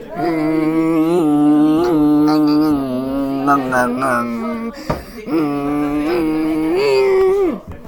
Boy Making Bike Sounds

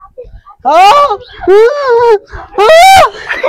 Aaah Ooo

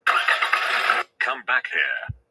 Car Start Come Back Here

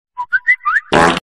Samsung Notification X Fart

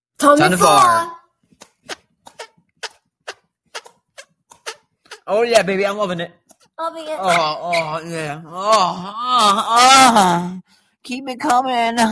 Time To Fart

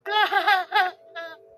Nervous Laugh; Baby Pogo

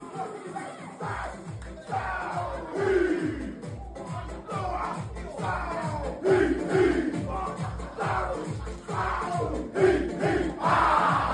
Hi Hi Haaa

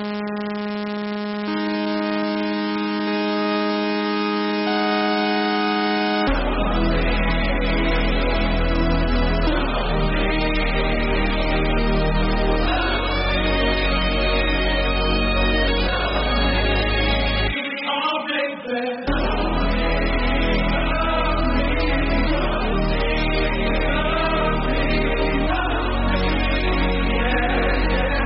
Love Me Choir Chorus

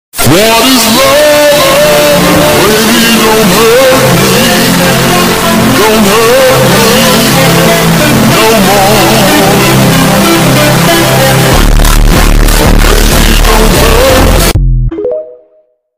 Music Loud

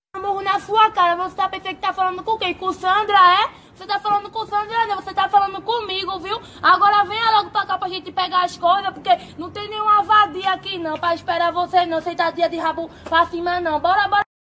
Sad Piano Instrumental

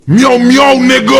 Meow Meow

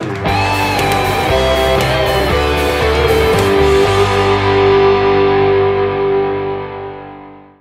Sitcom Outro

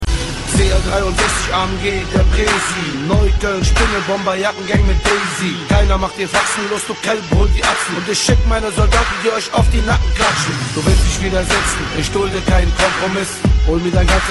Rap

